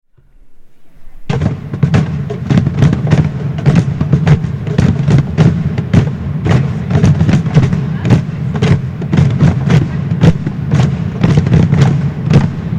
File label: Cajons